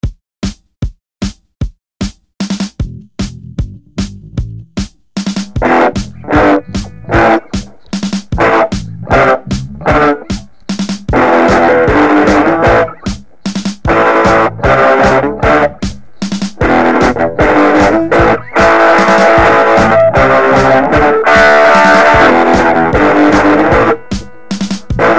rock n roll sound

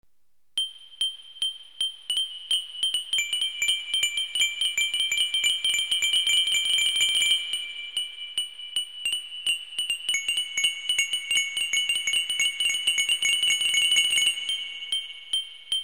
pling 138

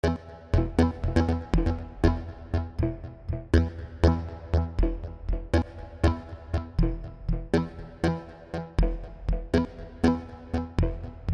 bassynth long

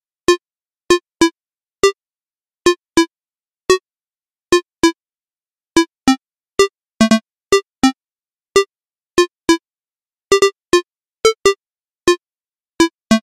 square

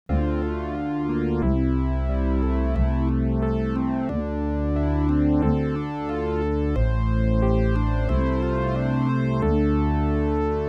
second song synth

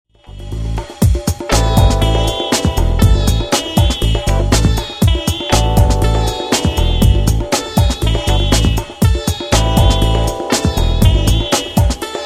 funky spirit of india

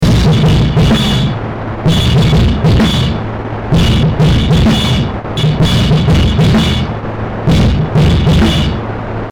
drums drive

